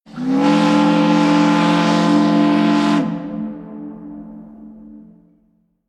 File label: Ship s Horn; Rehearsal Tracks